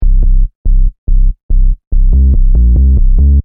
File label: Techno Trance Sub Bass Loop In C 142 Bpm; Bass Loops